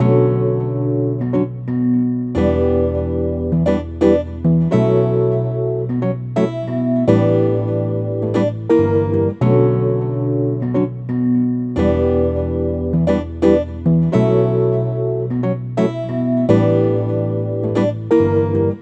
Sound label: Keys 102bpm Bm Melodic Layered Soul